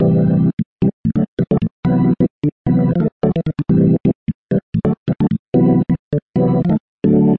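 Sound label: 130 church organ A minor Dorian; KEYS ORGAN PIANO LOOPS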